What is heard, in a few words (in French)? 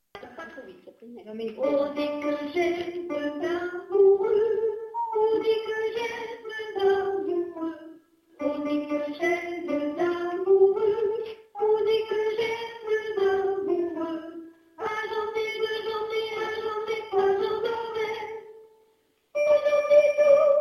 soprani; On dit que j'ai 2 amoureux